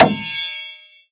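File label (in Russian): звонкий звук удара железом по железу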